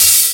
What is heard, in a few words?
HH OPEN; Big Beat Samples & More; AKAI